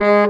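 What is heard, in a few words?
SAX STC G#2, Baritone Sax Staccato; Orchestral; VarazdinOrchestral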